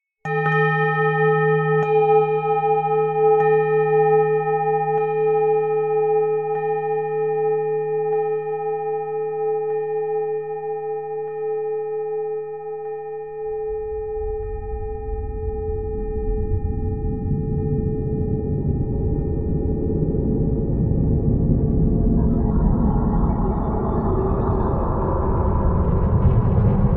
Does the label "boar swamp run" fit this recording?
No